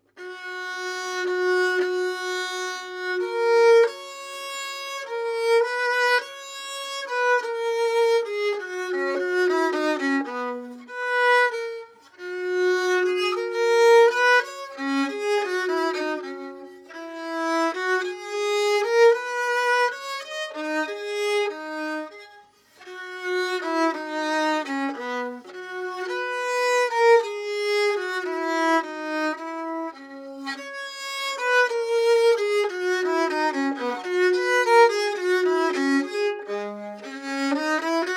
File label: Modern Bow; Bassano